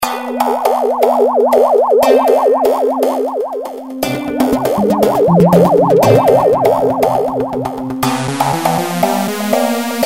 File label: beats